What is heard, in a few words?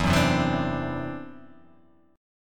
E 7th Suspended 2nd Sharp 5th, E, down